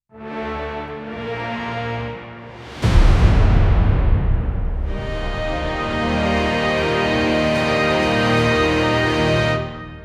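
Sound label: epic cinematic studio intro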